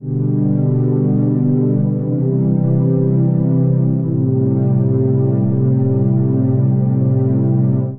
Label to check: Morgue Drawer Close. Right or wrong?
Wrong